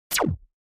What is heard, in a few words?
Laser Gun Blast Fast; laser fx